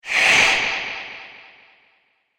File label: Sorcerer Sand Spell, magic sound effect; Fantasy sound effect